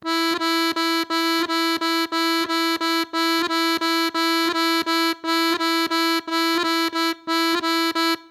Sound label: prall